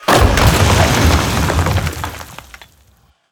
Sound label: Arcane Watcher Death